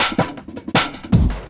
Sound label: Loop, Drum & Bass, 160 BPM